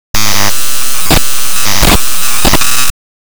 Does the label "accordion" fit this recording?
No